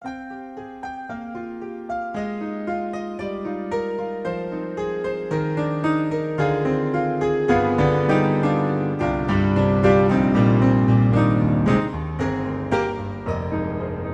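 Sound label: Roland SC-8850, piano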